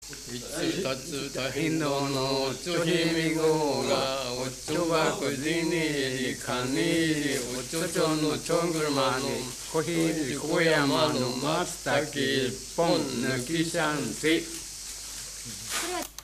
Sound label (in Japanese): itikutatiku, minyou; 袖ケ浦市; いっちくたっちく